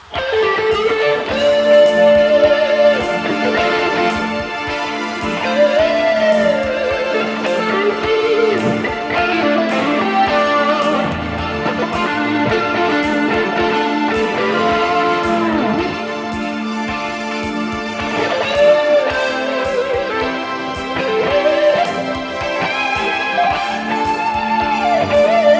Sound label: solo isolated